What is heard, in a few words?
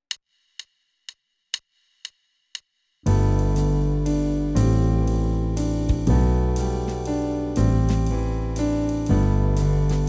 accompaniment only; Row Your Boat